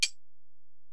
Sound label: Ac Perc, Drum Machines Alesis DM-PRO